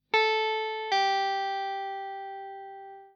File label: pull example, guitar